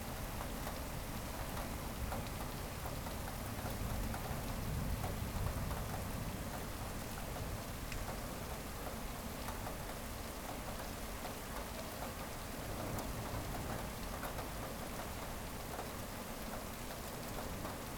rain window; 99Sounds; Rain And Thunder

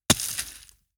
Hit Rock into Gravel; Rock, Brick and Dirt; Mechanical Wave

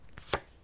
card Slide, Casino; Kenney's Sound Pack

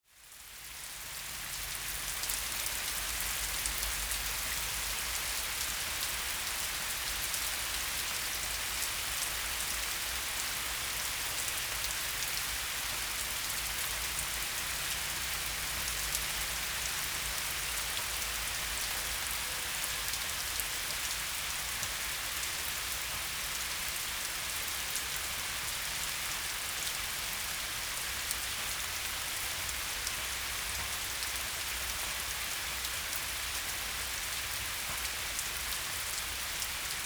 Rain in forest the day, Thailand; Charlie Atanasyan; Faunethic

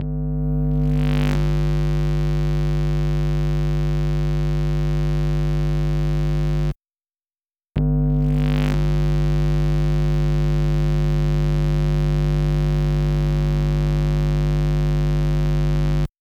Microwave - Power - Drone; Electromagnetic; Priezor

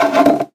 glass move; Sound Design Tools; Raw Foley

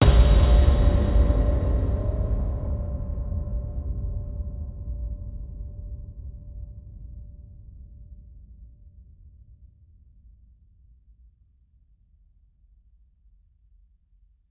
Mysterious Revelation, Cinematic Impacts; 99Sounds; Rumore Cinematic Impacts

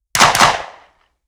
FN P90 5.7mm 75m behind clean Double shots; Ehrlund

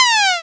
fall, Retro Sounds; Kenney's Sound Pack; Retro Sounds 2

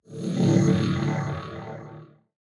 Movement; 99Sounds; InterSpace

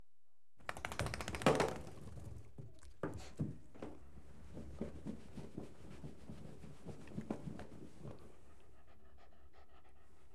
Dog Shaking And Being Towelled Do; Horses & Dogs; BBC Sound Effects Library

